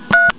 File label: PHONE; office